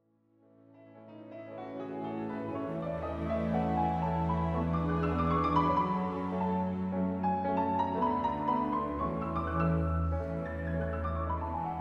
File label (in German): Violine Hammerflügel und Orchester Adagio; Felix Mendelssohn-Bartholdy; Konzert für Violine, Hammerflügel und Orchester MWV O 4 – Adagio